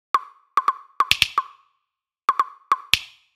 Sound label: Tom Arrangement